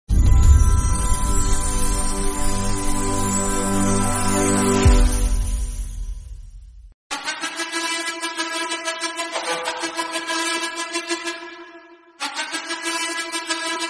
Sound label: Motivational Instrumental Hindi Song